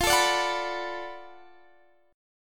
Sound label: D 6th F, chord; D6/F strummed